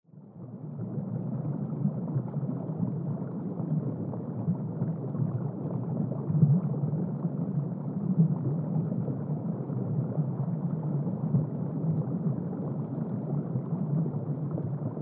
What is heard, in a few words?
underwater